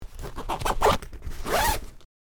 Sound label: Makeup bag zipper open or close